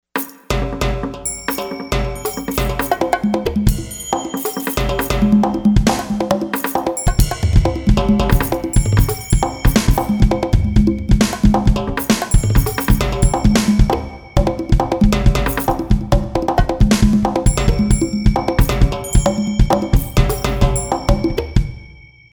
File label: Perc Set, Roland; SPD-30; OCTAPAD; EXPRESS for SPD-30 (Ver. 2) OCTAPAD